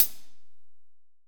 AMB CLHH, Drum Kit, AMBIENCE; AKAI S6000 CD-ROM - Volume 3; AMBIENCE KIT1

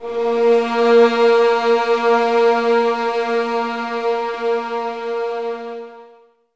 VS A#3, 11 Violins, Mute; Miroslav Vitous; String Ensembles

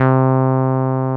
TB-303

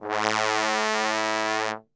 A1 BASS TRB, Brass; Best Service; Brass Super Section

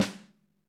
Ludwig Snare; EMU PX-7

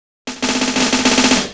Snare Fill

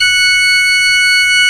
SCHARF F#4, SOLO OR; AKAI S-Series CD-ROM Sound Library VOL-2

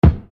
Bass Dr, EuroDance Bdrums

